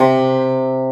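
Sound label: pluck C3, piano; AKAI S-Series CD-ROM Sound Library VOL-2; PF MIX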